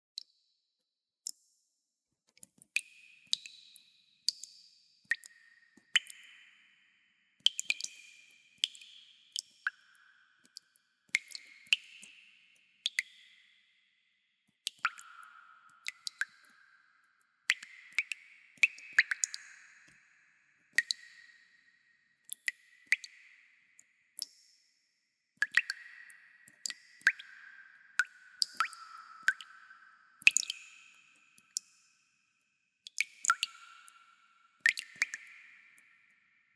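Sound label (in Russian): zvuki jeho, echo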